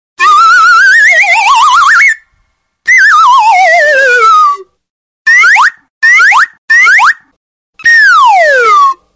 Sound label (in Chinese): dong hua pian jing chang chu xian de yin xiao